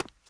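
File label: footsteps, right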